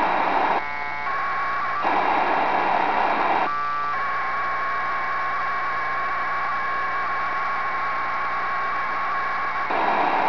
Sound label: DOVE